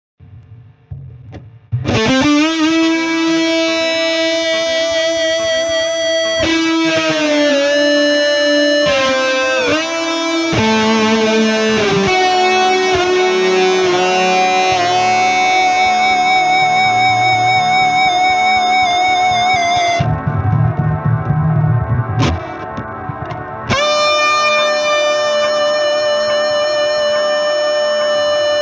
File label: feedback; ik multimedia